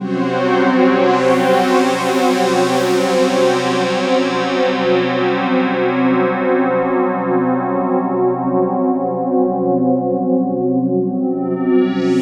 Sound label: tension, ethereal atmospheres; Soundscan - Ethereal Atmospheres